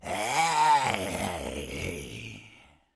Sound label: orc cheer; L2